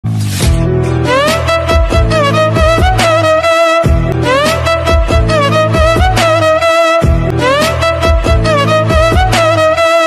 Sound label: mobile ringtone violin ringtones